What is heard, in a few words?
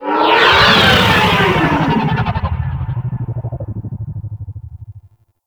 Fly By Quick D; Vehicles; Flight; Warframe; WM Liset